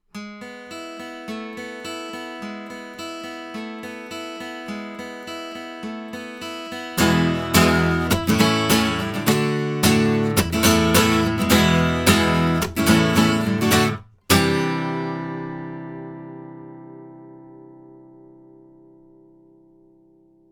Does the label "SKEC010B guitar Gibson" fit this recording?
Yes